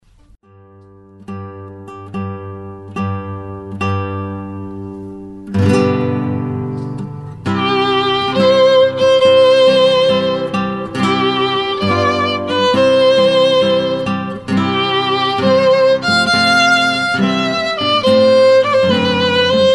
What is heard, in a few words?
Bridal Chorus